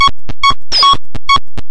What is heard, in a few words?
140 broken alarm, drum loops